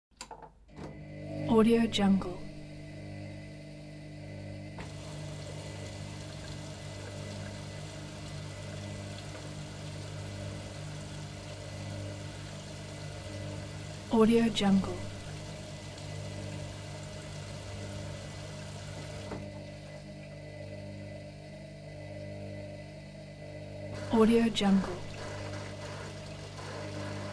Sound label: washing machine